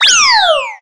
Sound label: Fall; Life of a Naomi